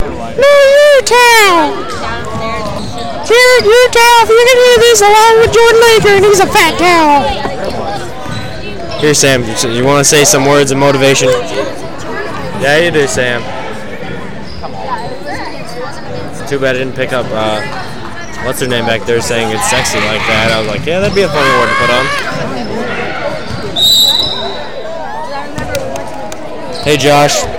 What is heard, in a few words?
haha